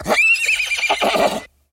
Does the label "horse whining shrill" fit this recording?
Yes